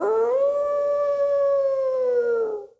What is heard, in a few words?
Dog; blue archive